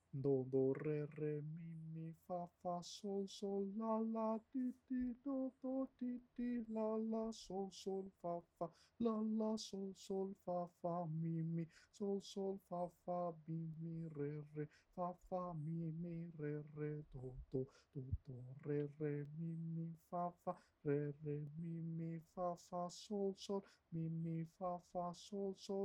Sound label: Sung